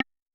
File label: Perc Wood High, Vermona Mono15 Drums